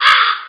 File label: crow cawing